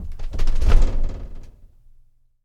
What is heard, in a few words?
Boxing Ring Bodyfall